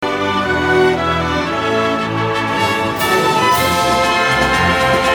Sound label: Game Tune